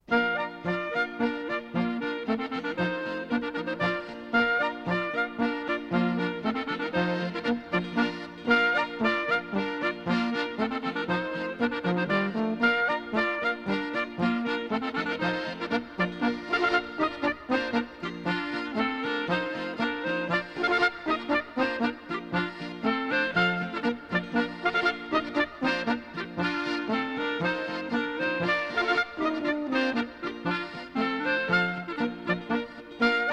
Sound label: wilde hilde polka